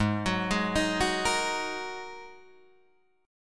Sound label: G sharp 7th Suspended 2nd G sharp Guitar DADGAD